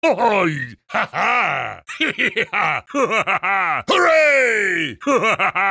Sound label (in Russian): Dikij smeh; Clash Royale